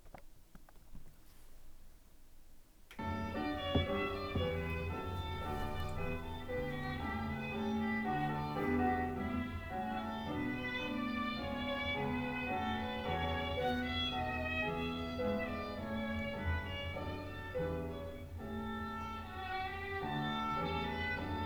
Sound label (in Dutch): Cocoon, Viool en piano